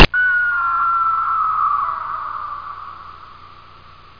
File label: EAGLE